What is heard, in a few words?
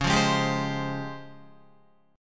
Db Major Sharp 11th, Guitar; strummed; JGuitar